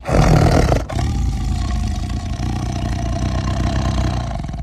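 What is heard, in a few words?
Tiger Growl Low Breath; big cats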